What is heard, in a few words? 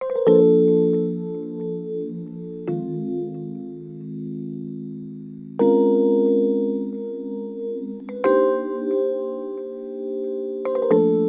Rhodes Attacks; Ueberschall